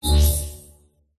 futuristic bot coin or power up magic spell cast conjure craft mobile app